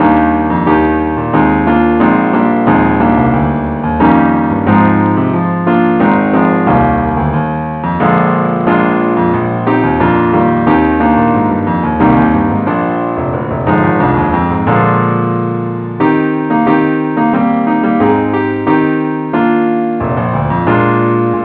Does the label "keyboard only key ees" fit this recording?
Yes